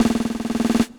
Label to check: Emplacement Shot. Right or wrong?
Wrong